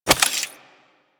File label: Reload, Pistol; Amphis